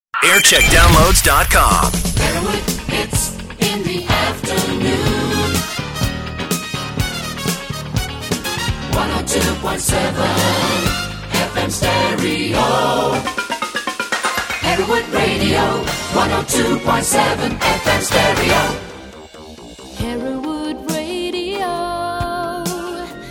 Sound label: jingle montage; hereward